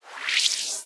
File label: Sci-fi Transition